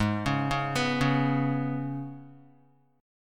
Ab Minor Double Flat 5th down Guitar; Abmbb5; arpeggiated

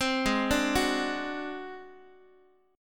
G sharp 7th Flat 5th G sharp down Guitar, chord; G#7b5; x,x,3,6,6,5; arpeggiated

